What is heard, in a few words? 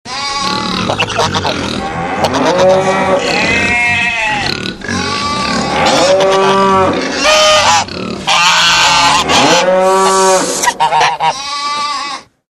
funny animal mix; animals